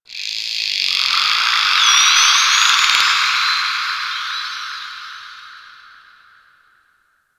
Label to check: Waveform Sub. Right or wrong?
Wrong